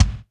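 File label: GATE KICK; Crypton - DrumBase5000; GATE KITS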